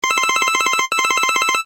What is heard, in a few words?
Basic Ring